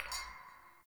Bar Glass Move; Warframe; Nineteen Ninety Nine; Hub; Hub Pub

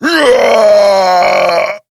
Death; New War; Kahl

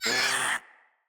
Kavat Vocal Pain; Warframes; Khora

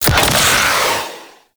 Space Fighter Dredger Weapon Fire; Grineer

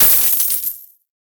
Fist Claw Coin Impact; Incarnon

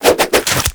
Nunchuck Quick Melee Return; Warframe; Infested

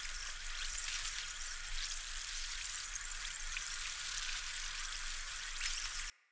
Team Heal Active Loop; Enemy; Sinecure; Melee Grunt Dual Agent; Abilities

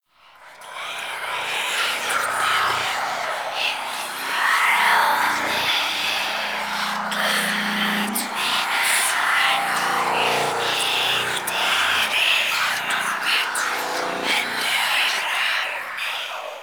Whispers High Pitch; Ambience; Warframe; Entrati

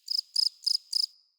Forest Open Glade Cricket Close

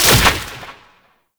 Orb Destroy; Warframe; Duviri; Conservation

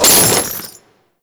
Chains Beam; Condemn; Warframe; Priest

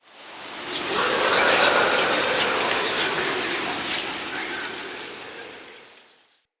Ancestor Tree Wind; Fey Hub

